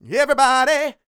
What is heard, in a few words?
SING, Vocal Planet R&B & Dance; ILIO